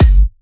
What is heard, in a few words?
128BPM Kick; Vengeance Samples; Destination Kit